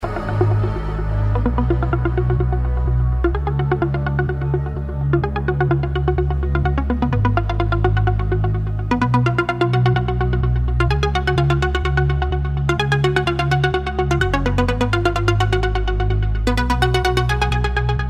progressive eletronic music

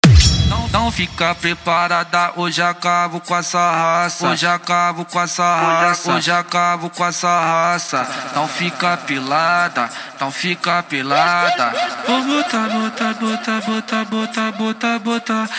set funk bass

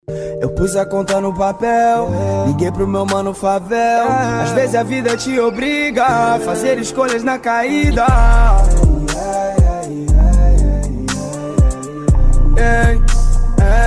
mega bass

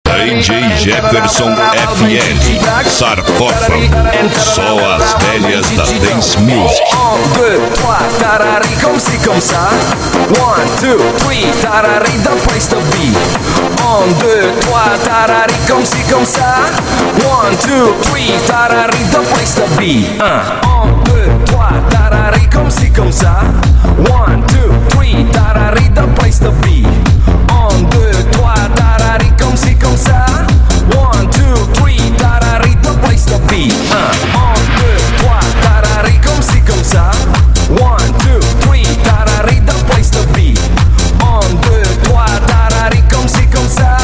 dance musik

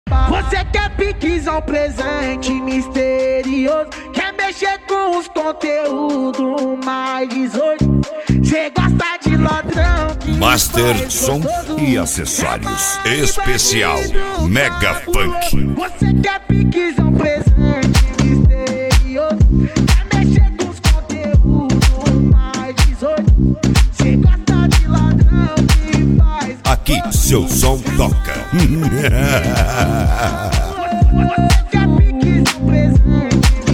mega funk; master som e acessorios

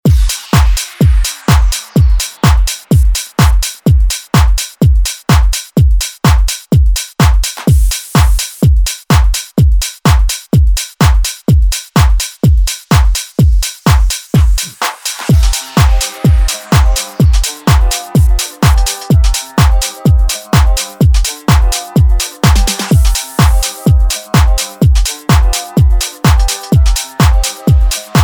electro funk

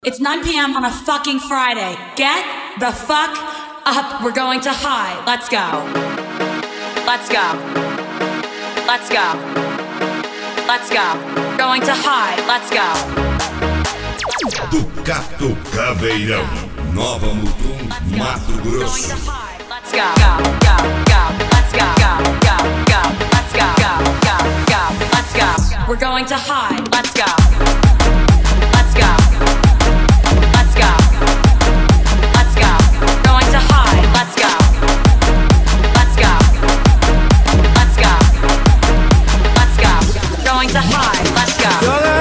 especial mega funk

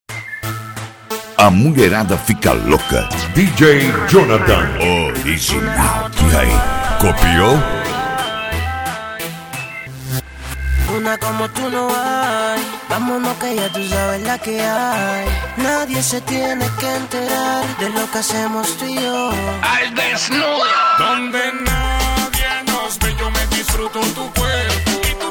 reggaeton mix; reggaeton mix evolution

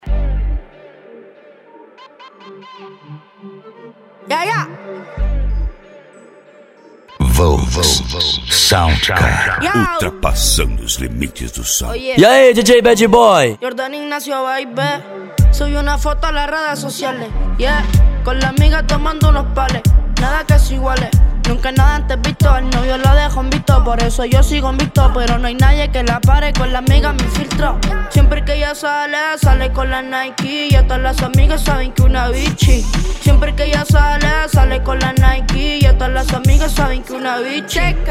sound car reggaeton; cd volks sound car

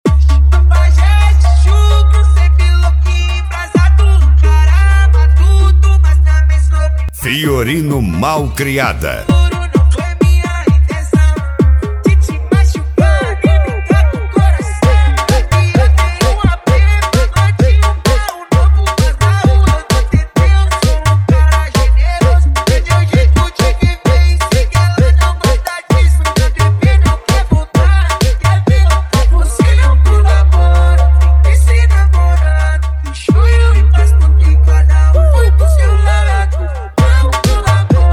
mega funk bass

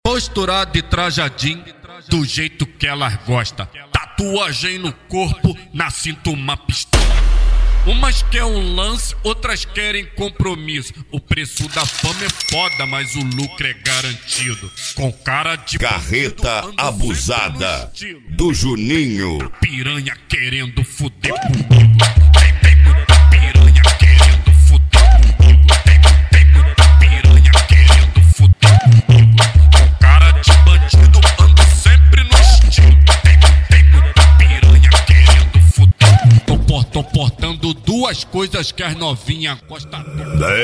mega bass

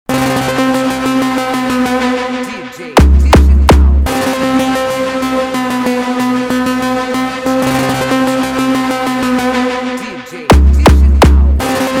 extreme bass; carreta

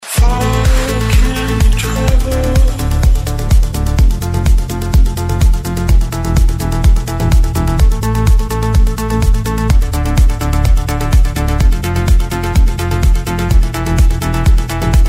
progressive techno house

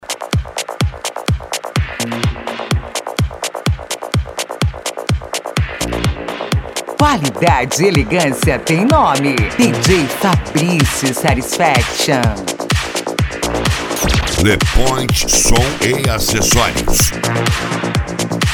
deep house

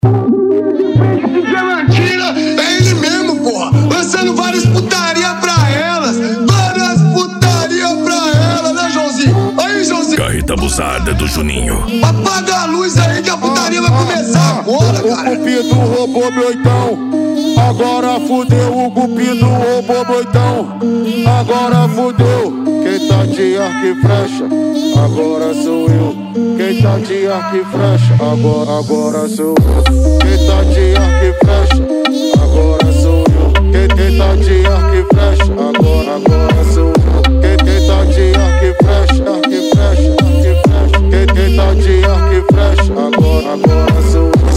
mega bass

